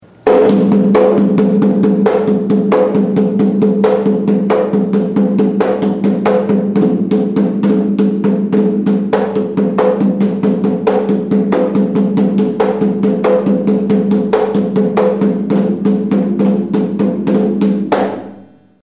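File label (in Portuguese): marcacao, atabaque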